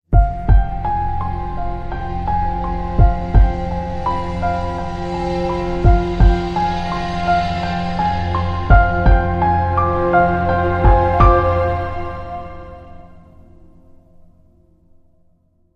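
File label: Crime Sting, No Percussion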